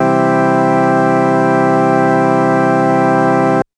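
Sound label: Organ Farfisa major; TrashLibrary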